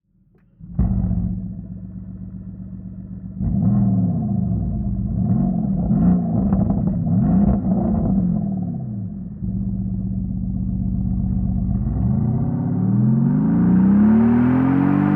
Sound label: EVOLUTION EXHAUST SYSTEM PORSCHE CAYENNE V8; turbo e hybrid akrapovic evolution line titanium performance exhaust